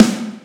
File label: snare